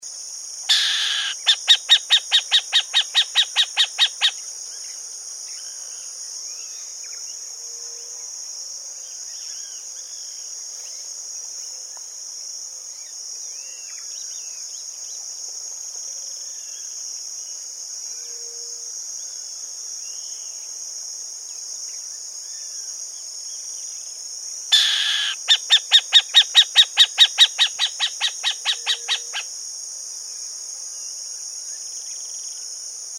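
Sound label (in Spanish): Lechucita Vizcachera, Athene cuniculiaria, Burrowing Owl; cantos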